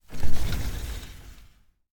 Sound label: window open